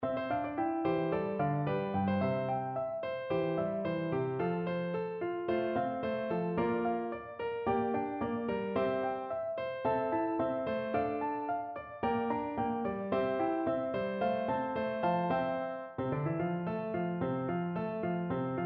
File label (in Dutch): Chorus, S-A-T-B, koorpartij oefening; Händel (Georg Fredric ); Alcina